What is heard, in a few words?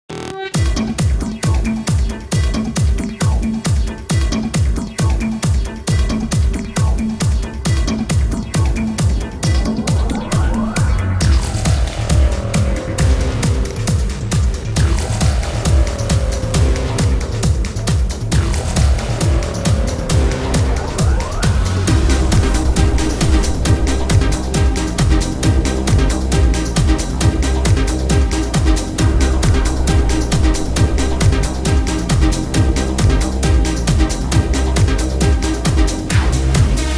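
techno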